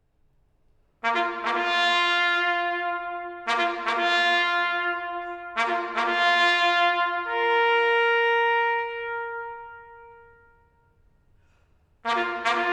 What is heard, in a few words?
Reveille; Music for Remembrance Sunday - All Saints Parish Church Glossop